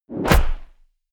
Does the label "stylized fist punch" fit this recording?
Yes